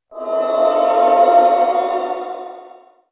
sanctuary, Ambient; Revista CD Expert 45; ZEUS

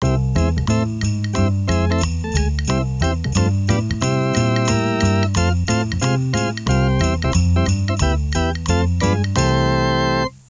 Jingle; HOLIDAY

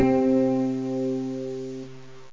roland d50; synths

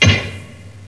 rock; PC Gamer IT CD 38; thief

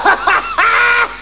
laugh!!; Amiga-CD 1996 #5-6

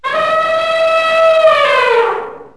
ELEPHANT; animal fun

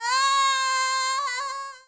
Am fall

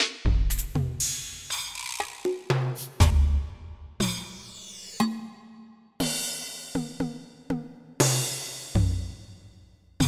Jungle, Soundfont; Onj's Soundfont

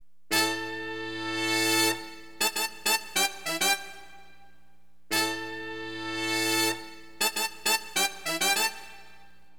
FNK HORN, Wind; LiveAct Pool; AcidJazz Funk

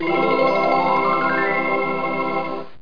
heal; landslor

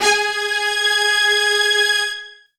Strings G#4; Cyclick Seduction; Kit C

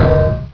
bounce; Hexen II; assassin

